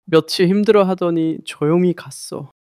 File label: crying